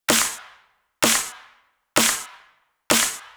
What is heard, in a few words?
Clap, 128 BPM; Vengeance Samples; Vocal-Hook Kits; Song Kit; Freaky Boys